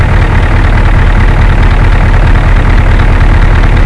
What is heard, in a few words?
tempest diesel; quake2; fox